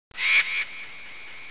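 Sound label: vulture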